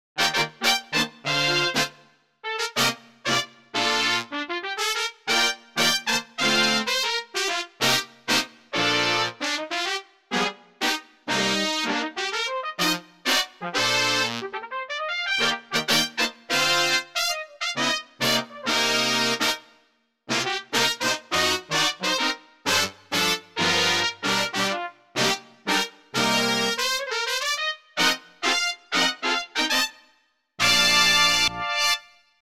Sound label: Grace Notes Brass